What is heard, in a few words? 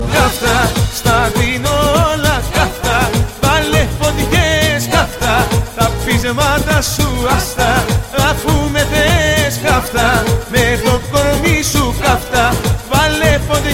Greek